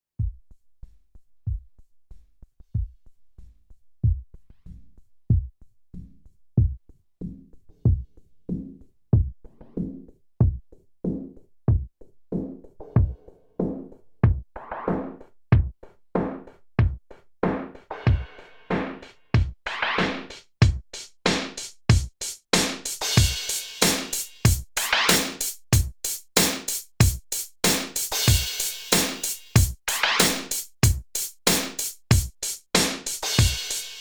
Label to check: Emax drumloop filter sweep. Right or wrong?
Right